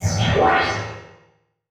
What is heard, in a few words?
Transition Metallic Blast; Production Element; Title Transition; AdobeCC